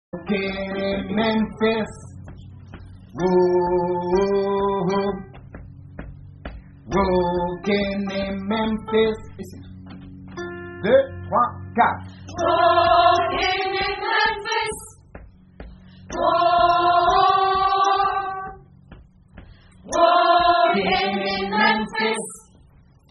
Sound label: Walkin Soprano